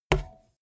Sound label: bmx bike tire kick